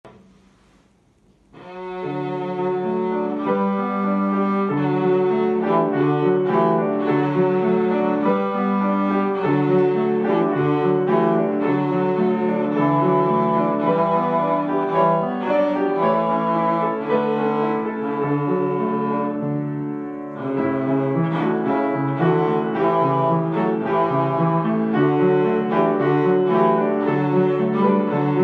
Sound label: Cello